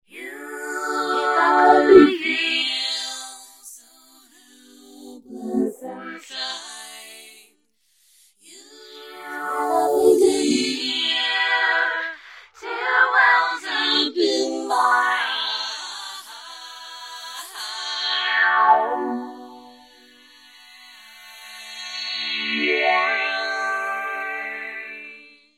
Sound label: vox